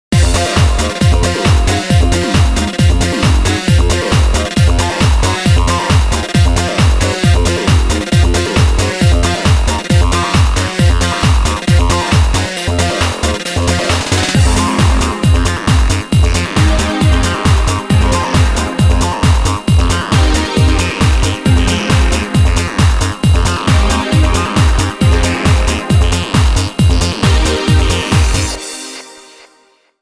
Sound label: CREEPY DISCO